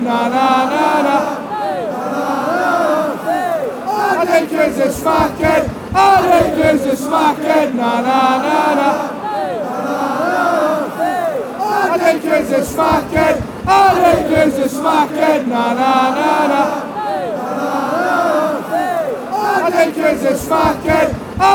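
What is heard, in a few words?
fanchants, football chants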